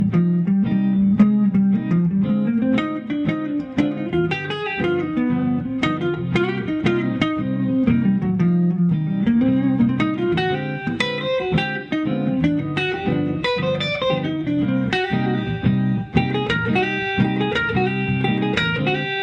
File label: jazz jam ringtone